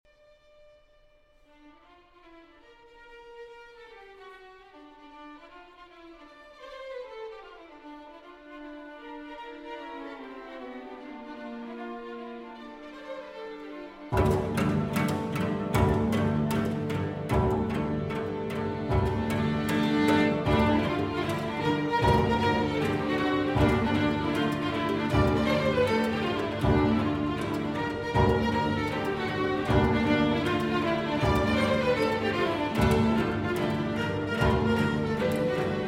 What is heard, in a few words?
For String Orchestra; V. Il Deserto Rosso